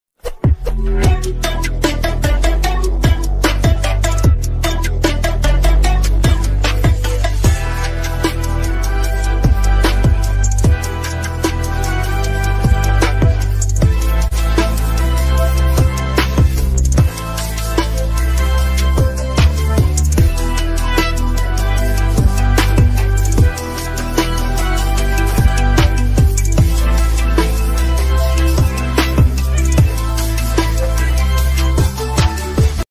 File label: ringtone bgm ringtone attitude ringtone